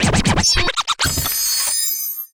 notifications; RED; Hydrogen-One

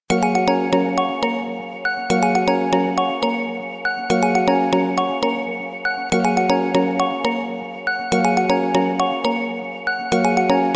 Stimulation, ringtones

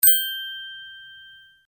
Download success; BenQ B502

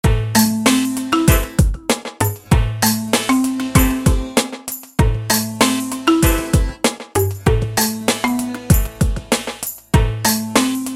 Loop, ringtones; Explay Neo

